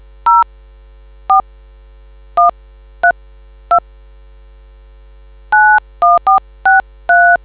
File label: dtmf